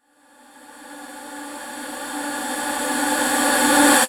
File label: Reverse Vocals D; Effects; Vengeance; Essential Clubsounds Vol.4